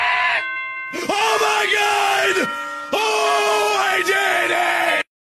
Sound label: doorbell